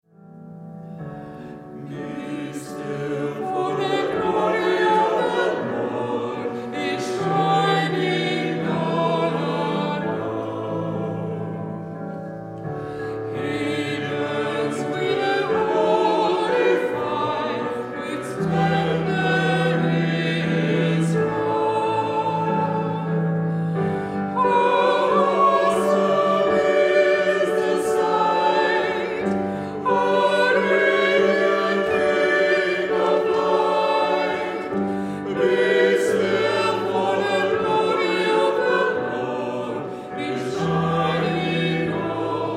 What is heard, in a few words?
Music